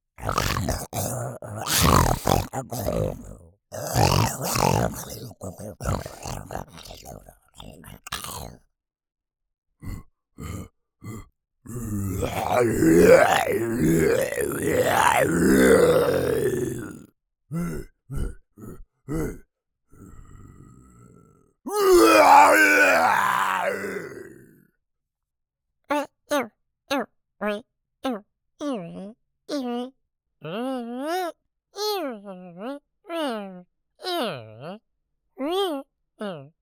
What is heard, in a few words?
Creature Noises